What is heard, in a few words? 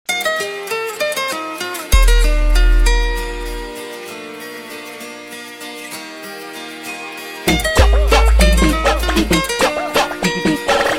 funk; nokia